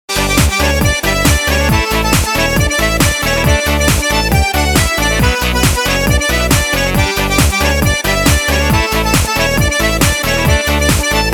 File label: Dance